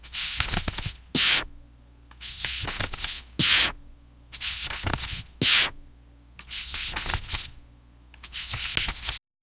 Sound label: gentle page turns in rhyt; document